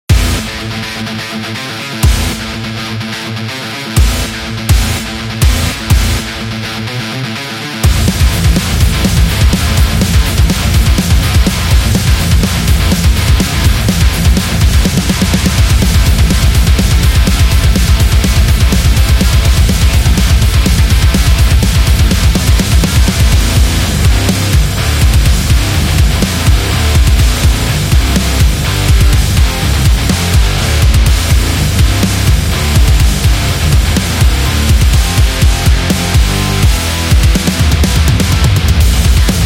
Rock Energetic

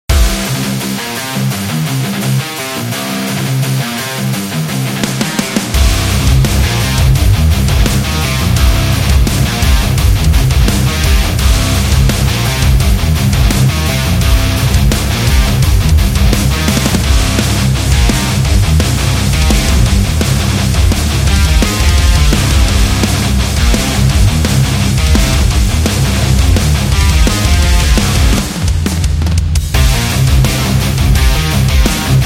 Oil

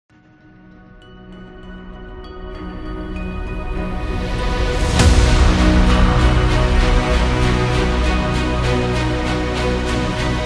Epic Sci Fi Trailer

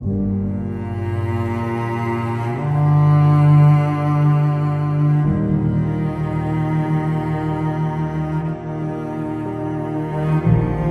Inspiration Sad Cinematic

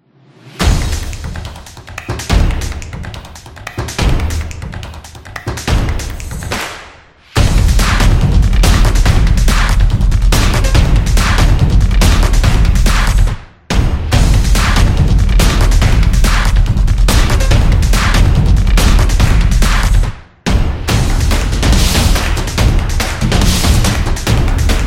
Drum Upbeat; fast tempo

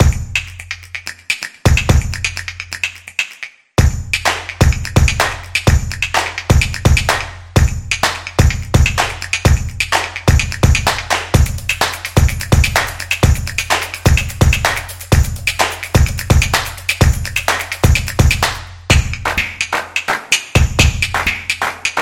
Promo Action

